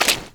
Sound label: fs fm paint, footsteps; Portal 2